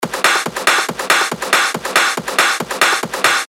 140 BPM, techno top loops; Azure; revealed techno top loops vol 8

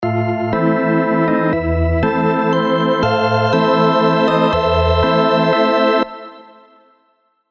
Hammond; alonso happy holidays 2021 spire soundset

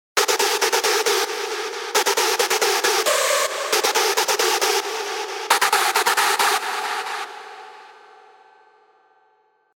Screech; Screech Stomper; Revealed Serum 2 Big Room Techno Drops Vol. 1

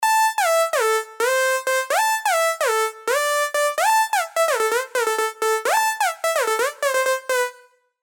Sylenth1 dirty dutch leads; Golden Era; revealed sylenth1 dirty dutch leads vol 1

